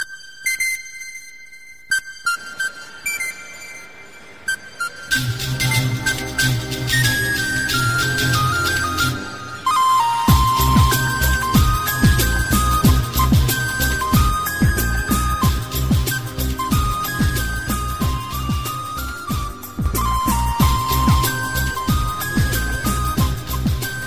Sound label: Flute N Beats